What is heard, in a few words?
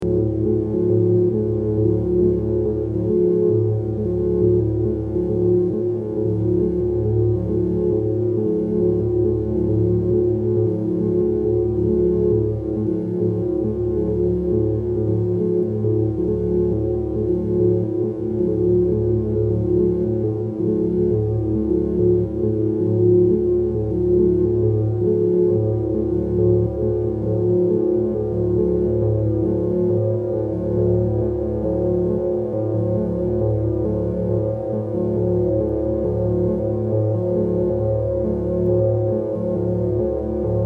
Modulated Drone W Reverb; analog synth